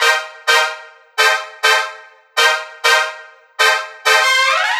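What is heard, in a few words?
Hit Trump, brass; духовые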